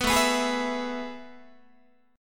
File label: A Major 7th Suspended 2nd, Guitar; AM7sus2 strummed; JGuitar